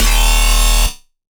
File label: Bass D# Roar, Dubstep; Bass One Shots; Ghosthack; Dubstep Sound Arsenal